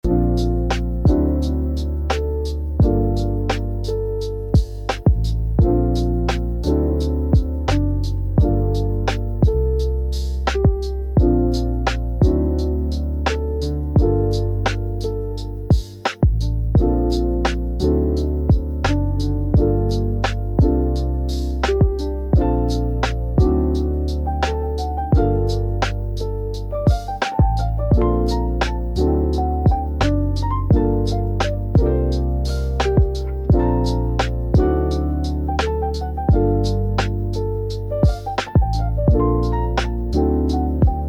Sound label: groove it chill lofi